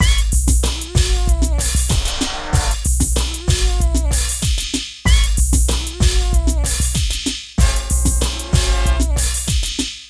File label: PHATT BEA, Hip Hop